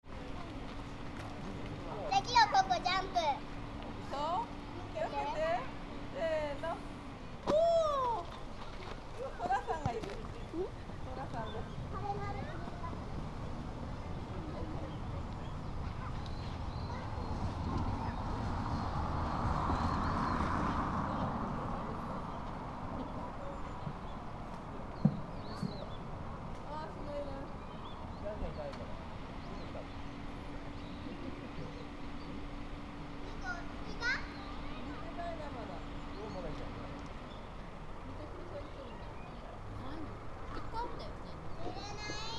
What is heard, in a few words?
Fukushima Soundscape: Mt. Shinobu; Mt. Shinobu Park; On a sunny winter day, several families were cheerfully playing at the park.; Koji Nagahata